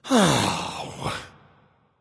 "ugh"; smite; voicepack; ullr

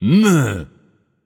tongue; smite; poseidon

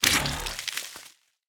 zombie eat; smite; ahpuch skin10

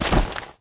step with armor; smite; chronos

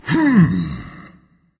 thinking berserker; cuchulainn

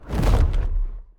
wing big; smite; god cthulhu

